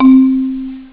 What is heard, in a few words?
Xylophone, Beeps, Simple; m68k-amiga-OS